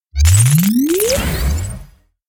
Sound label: Emote Sparks Heart